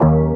Eb STAB, funky

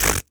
Human Beatbox, Beatbox Hits

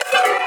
B STAB, funky

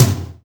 Toms

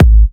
Bassdrum

